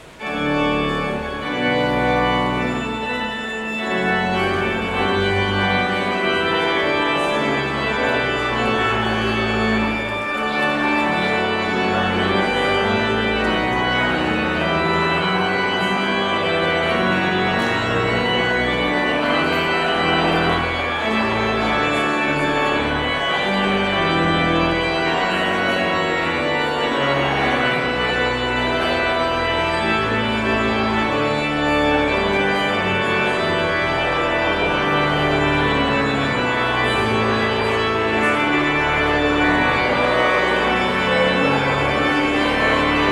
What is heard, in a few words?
Postlude